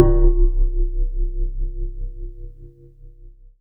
C1, Synth Line

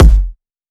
thicc kick

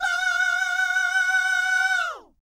VIB FX, Jazz FX

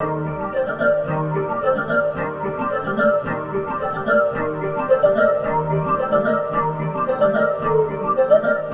Guitar Textures

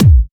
Trance Kicks

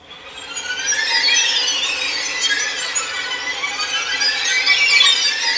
STR FX, HORROR; East West - Global Sounds

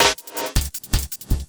LOOP SD, 160 BPM; Ueberschall; Drum & Bass Flash Trackz

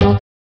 chords

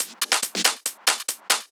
Loops 140 BPM Hihat Percussion Loops; Vengeance - Essential Clubsounds Vol.4

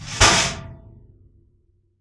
STOVE SMAS, PERCMETALIC; Rarefaction; Poke in The Ear With A Sharp Stick

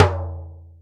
Jazz Tom, Drum Machines Roland R-5

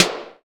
DMA XD-5, DIGITAL

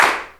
CLAP, ELEC.DRUMS; Crypton - DrumBase5000

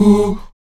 VOX AHOO, Afro Chants, Stacc Ah Oos

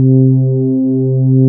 PD DARK, Nord, LEAD PAD HRN; Wizoo - Powered Nord

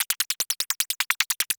perc zipzap kit; Polyend; Recue; percs glitches and layers

